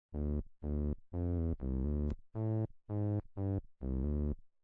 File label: Fuzz, combo organ; Rheem